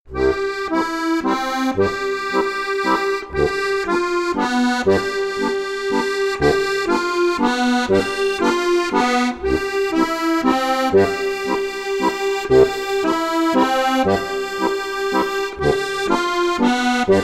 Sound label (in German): walzer